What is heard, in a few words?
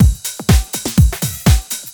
house drum loop dry